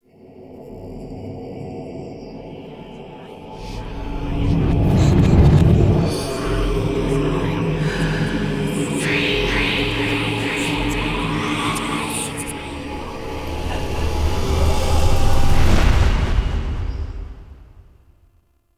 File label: Dormizone Intro Dream Stinger; Warframe; Duviri